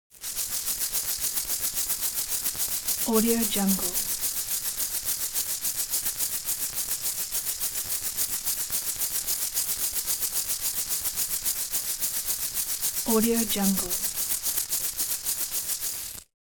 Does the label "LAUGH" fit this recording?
No